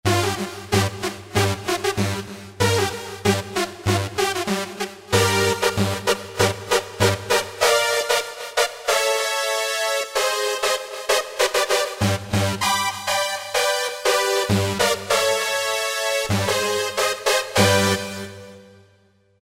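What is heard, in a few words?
eurobeat brass; Roland SRX-05 Supreme Dance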